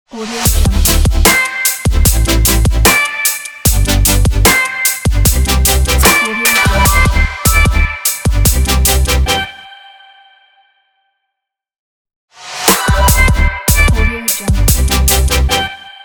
future bass logo

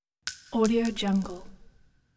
spent shell casing chunky reverberant

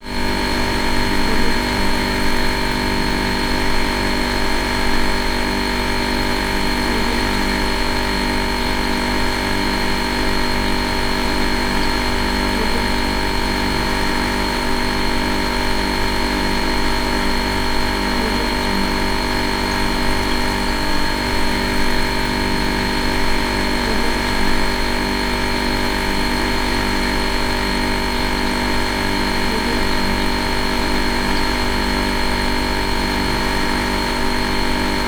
large refrigerator

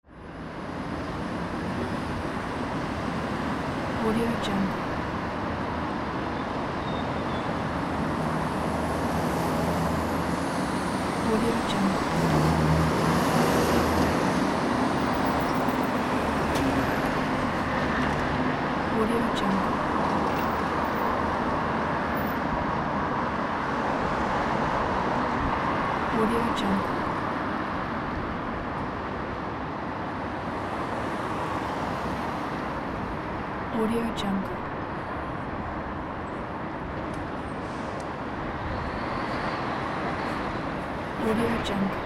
sounds of the city

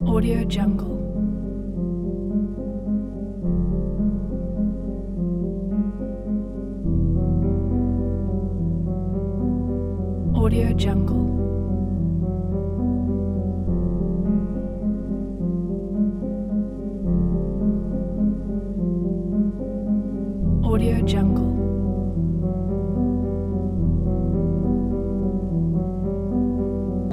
fear

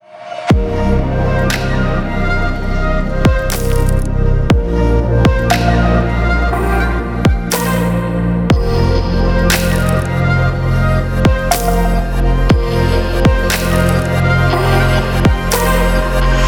abstract fashion chill logo; puremusic

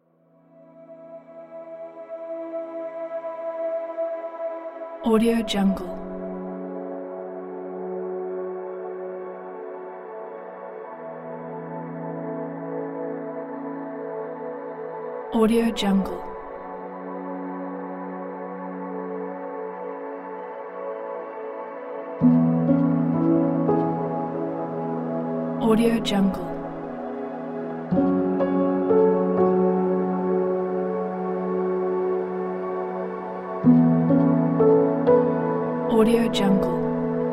evening clouds

